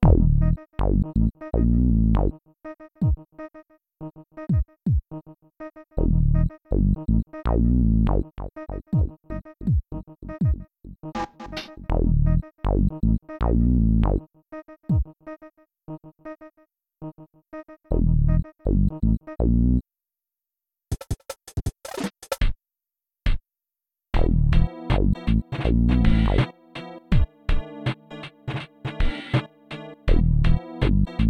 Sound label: BGM; Mario Artist Talent Studio